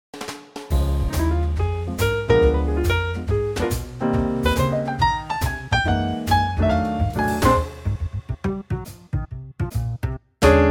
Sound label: Trio; key - Ab - vocal range - Eb to Bb; Superb Trio arrangement of the main theme (verses not included) from the movie Cabaret